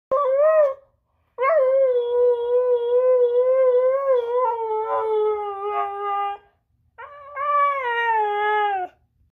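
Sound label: Husky, howl; زوزه هاسکی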